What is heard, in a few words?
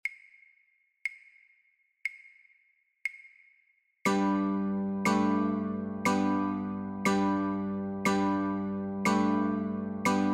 Notes and Chords, guitar